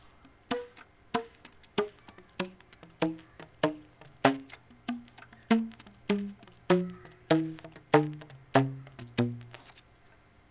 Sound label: down, slaptongue, sax